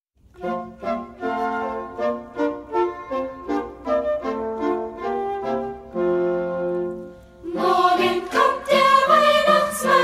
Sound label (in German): Kinderchor